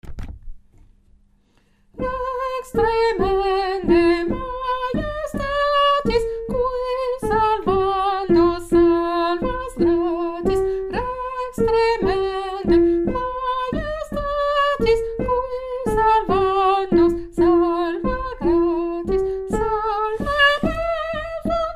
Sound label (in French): lettre ABCDEF soprano, chorale